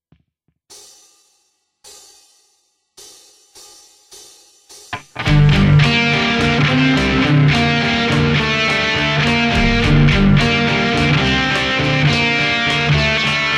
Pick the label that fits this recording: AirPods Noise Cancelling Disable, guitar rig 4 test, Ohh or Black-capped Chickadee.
guitar rig 4 test